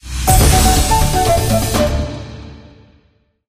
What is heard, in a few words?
bigwin end